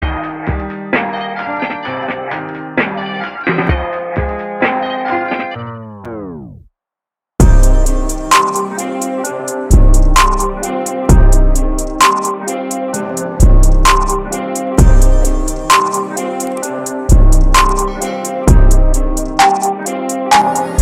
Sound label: Instrumental